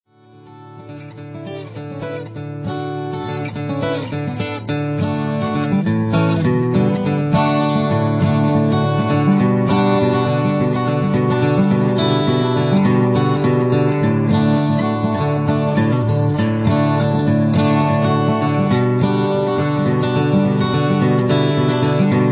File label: Acoustic Medley